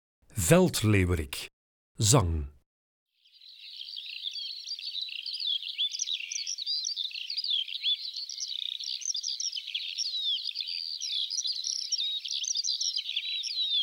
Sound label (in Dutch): Veldleeuwerik, Zangvogels; IVN